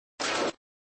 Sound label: Sound Tone, flipper; Tone v3